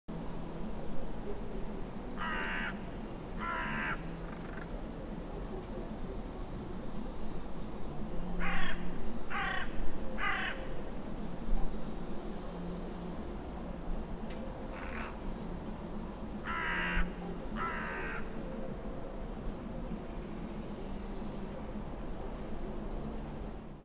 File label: birds citynature ambi; best service Blue Box